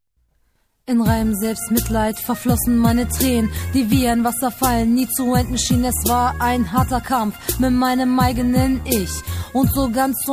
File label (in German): Rap